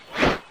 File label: ui popup